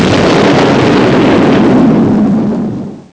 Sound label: EXPLOS